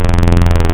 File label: Flux Saw Flange, wavetables; Animoog